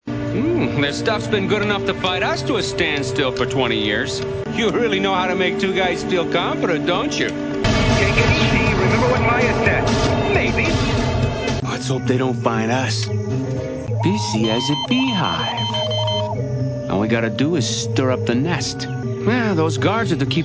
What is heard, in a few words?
grunt; Pat Fraley